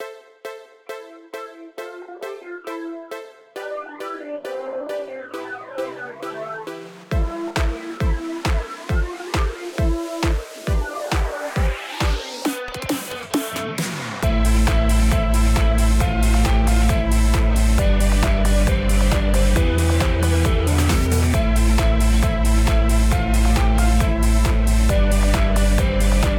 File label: Buzzy Woods loop